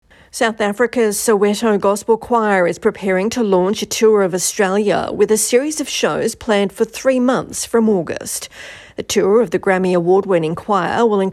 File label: choir; coming to australia for three month regional and metro tour